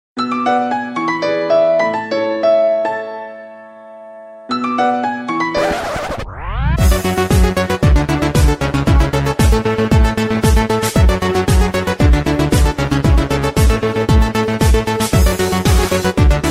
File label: Tricky